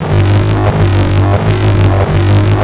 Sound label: fart